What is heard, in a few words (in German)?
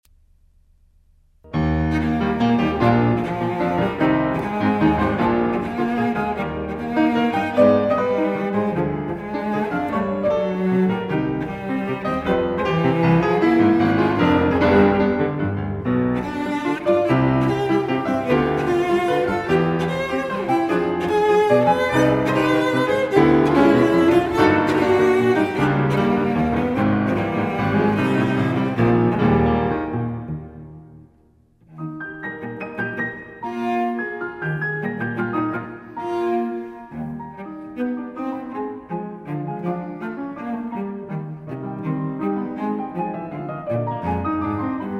cellosonate; 3. satz